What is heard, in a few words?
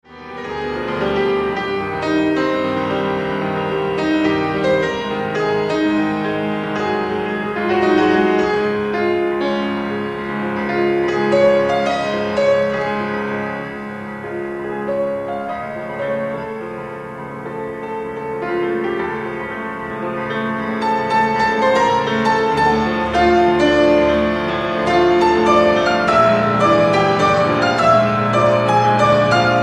Prelude in D Minor Allegro appasssionato